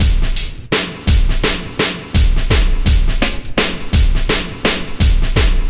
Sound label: Old School Break, Drums